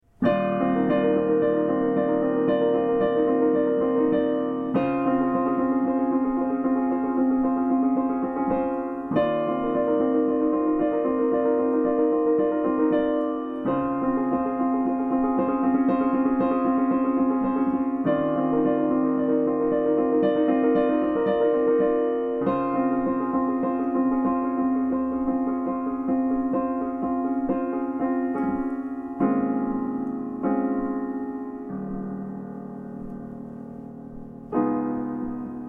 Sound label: piano